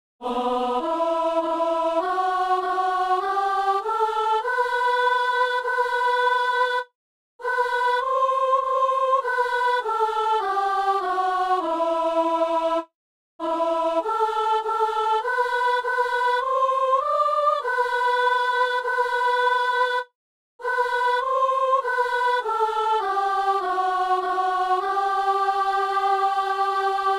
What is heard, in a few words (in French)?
en toi seigneur nos vies reposent, soprano